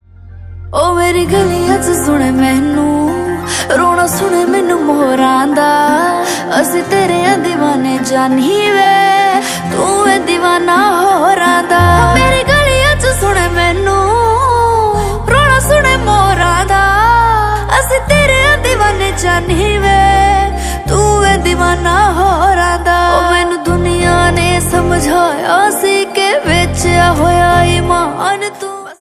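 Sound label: Indian ringtone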